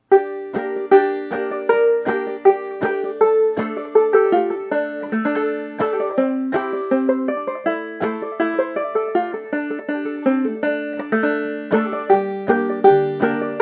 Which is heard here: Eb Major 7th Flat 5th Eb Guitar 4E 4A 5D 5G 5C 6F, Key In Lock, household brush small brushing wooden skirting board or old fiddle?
old fiddle